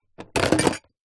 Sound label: household plastic tray tub containing glasses plastic cups set down